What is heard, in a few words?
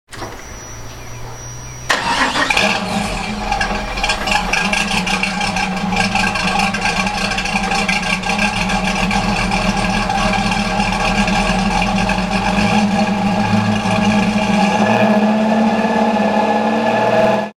Idle, exhaust